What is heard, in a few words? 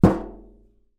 tin empty hit; foley; zapsplat